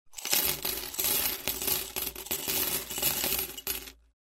zvuk zvona monet

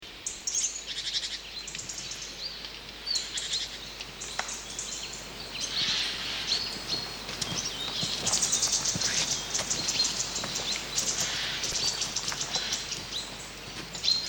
reka i pticy

